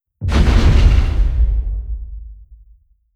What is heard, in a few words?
distance dragon wing attack; Stellaris; guardians